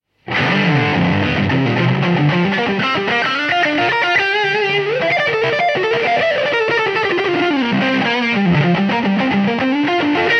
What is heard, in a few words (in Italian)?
LEADER EXPLORER NECK eq